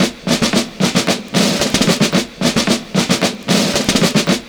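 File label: MILITARY SNR, 112 bpm; Univers Sons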